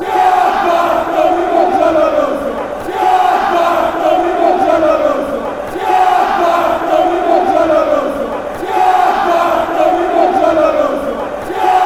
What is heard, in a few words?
yellow red, fan chants